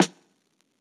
hiphop snare, Effect on

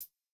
hihat; Reference Beat BPM120; j city pop 1980

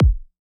chillhouse kick; Electro

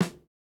snare, snare house

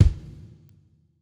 hall resonance kick

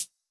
hihat; Electro; futurefunk

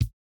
no effect j city pop 1980 kick

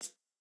Jrock hihat

